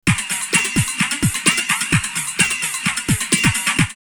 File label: Metal 2 Metal 129, drumloops, Rave; X-Static Goldmine; 90 s Sample CDs